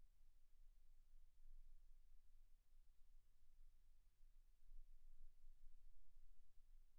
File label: silence 48kHz